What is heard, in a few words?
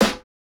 snare, snare house